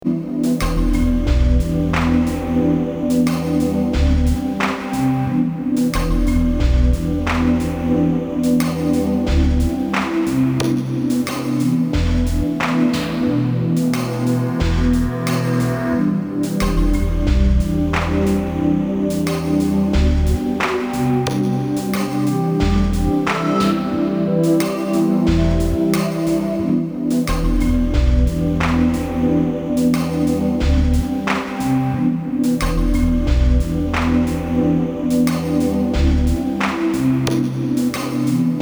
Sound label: GM loops